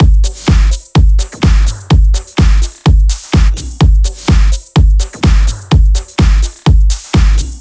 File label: Thai Kit 6, 126BPM; Vengeance Samples